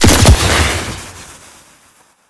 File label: thermite impact; gmod; viper